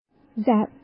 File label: zap